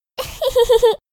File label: giggle